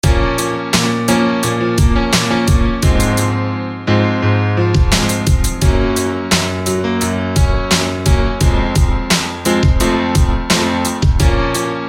Concert Hall Piano

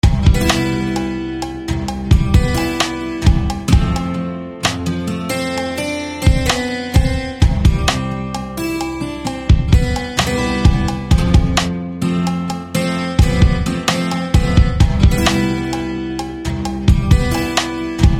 Reyburn Guitar; SFB FX

